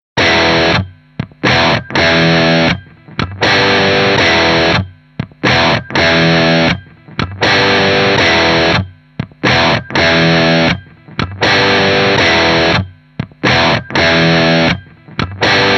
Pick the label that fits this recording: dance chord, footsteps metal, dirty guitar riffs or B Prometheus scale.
dirty guitar riffs